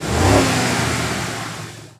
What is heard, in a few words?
start